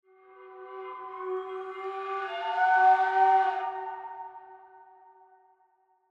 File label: Scary Scratchy; The Last of Us